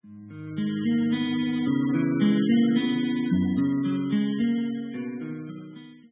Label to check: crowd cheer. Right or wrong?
Wrong